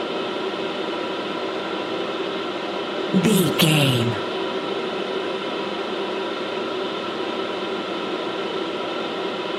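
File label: background air vent vacuum hum motor loop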